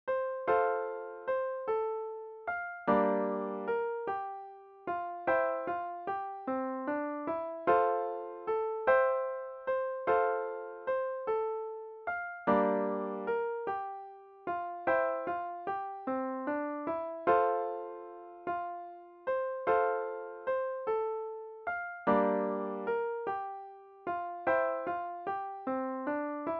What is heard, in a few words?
Harmonica (in F)